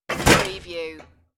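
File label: Old Dish Washer Close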